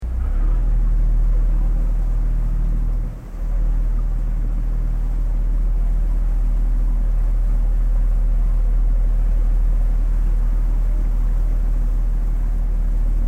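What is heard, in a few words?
Boat Tourist Ferry Manoeuvring Slowly; Manauevering; Boats (Sound Effects:Vehicles)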